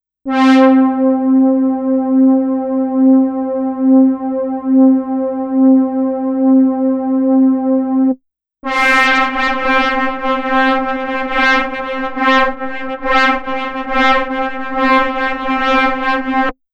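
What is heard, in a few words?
wrecker, talking; wrecker audio examples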